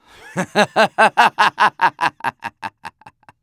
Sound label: laugh happy; Medic